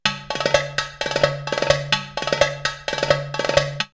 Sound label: Fast Rai Rai 130, drumloops World Ethnic; X-Static Goldmine